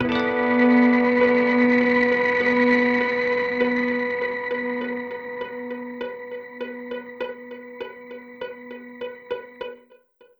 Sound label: crystal cathedral, 100bpm, full; algorave